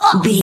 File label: voice female hurt pain choke